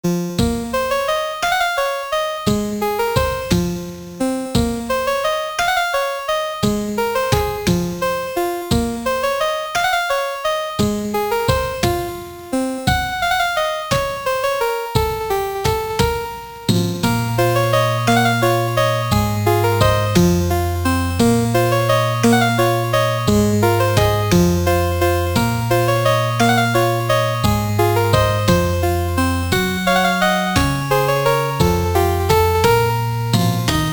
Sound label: Celtic Harp